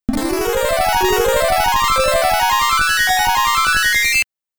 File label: Game Over, SID Stereo; Wiz; Commodore 64